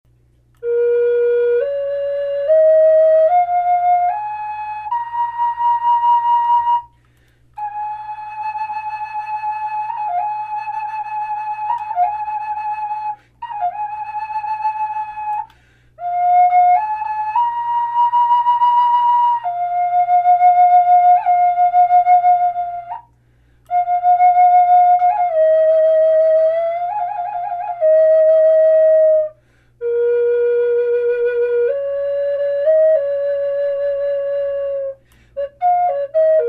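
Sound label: natural, flute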